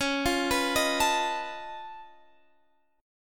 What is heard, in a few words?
C sharp Augmented 9th C sharp down Guitar Standard, chord; C#+9; arpeggiated; JGuitar